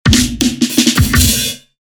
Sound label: live drum tone